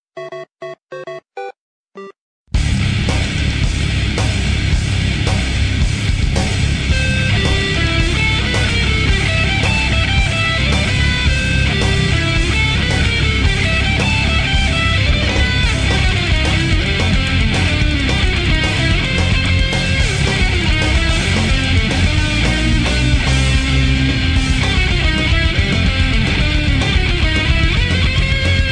theme song; mario

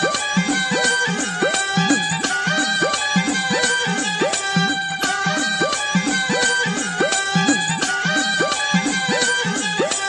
sarangi dhol

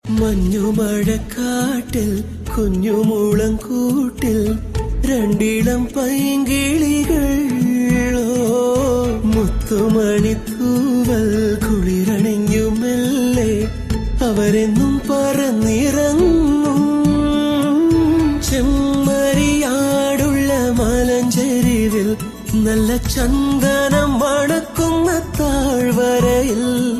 male, ringtone

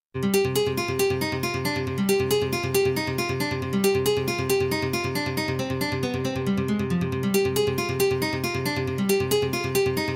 nice guitar; classical

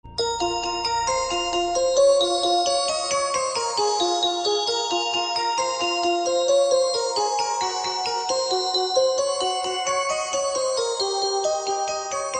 Metal

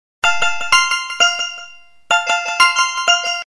blackberry new ring; ringtones

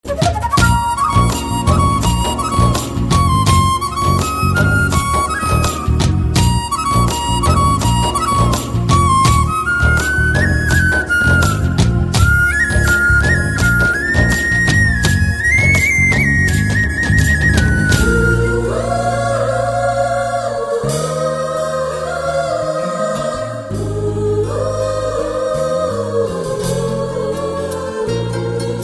nice flute and drum; alternative

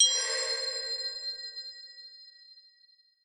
Whisper

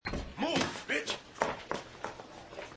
toilet scene; white chicks

